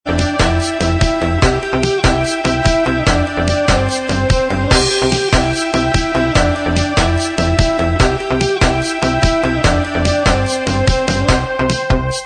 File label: Inst